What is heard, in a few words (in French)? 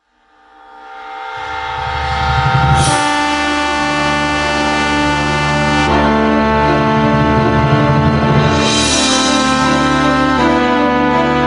Ambiance renaissance de lyoko orchestral